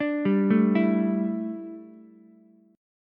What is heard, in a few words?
D Suspended 2nd Suspended 4th D down Ukulele; arpeggiated